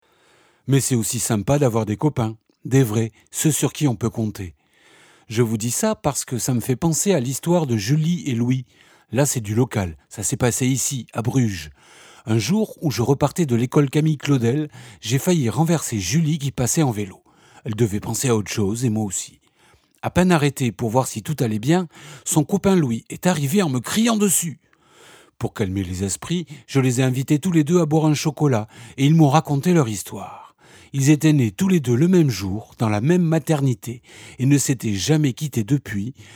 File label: transition